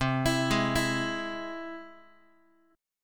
C Augmented down Guitar; arpeggiated; JGuitar

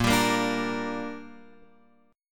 Bb 6th Bb, Guitar Open F; Bb6 strummed

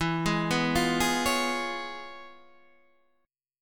C sharp 7th, E; arpeggiated; chord

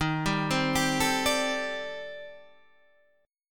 C Minor 6th Add 9th D sharp

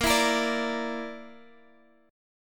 Bb Major Flat 5th; x,x,8,9,11,10; strummed

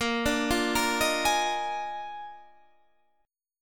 Eb Major 7th Suspended 2nd Suspended 4th Bb

